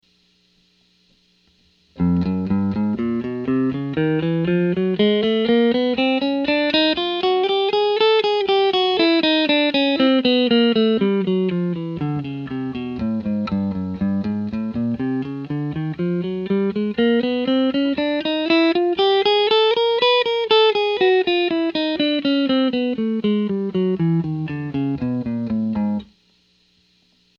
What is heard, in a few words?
Chromatic, guitar; practice guitar 15 minutes